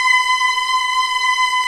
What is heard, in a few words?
strings slow, string orch; Roland LCDP13 String Sections; STR Combos 2; CMB StringOrch 2